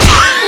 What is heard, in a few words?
crit hit; slender